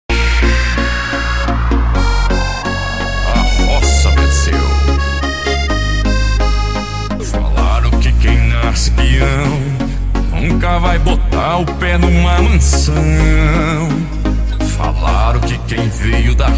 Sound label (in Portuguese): sertanejo remix